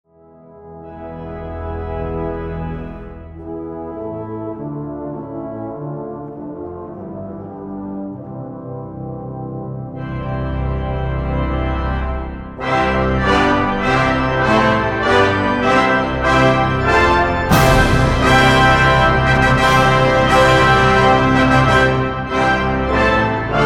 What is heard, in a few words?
Finale from The Organ Symphony